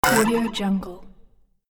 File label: character hit squawk